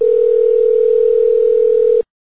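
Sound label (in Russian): долгий звук одного гудка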